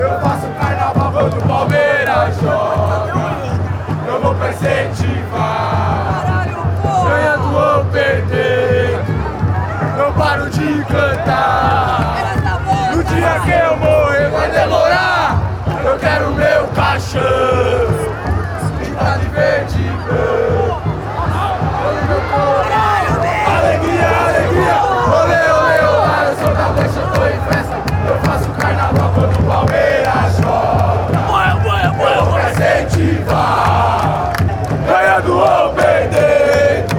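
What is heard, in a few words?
coracao verde e branco, football chants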